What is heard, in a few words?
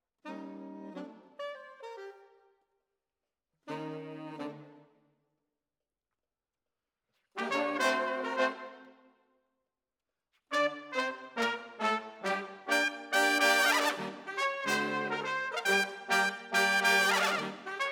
Brass Over